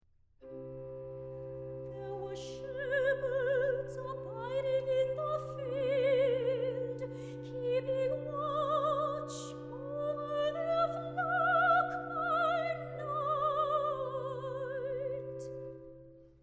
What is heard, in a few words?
Toronto Symphony Orchestra; Messiah - Handel